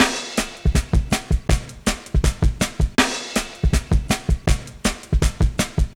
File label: 161, breaks; M8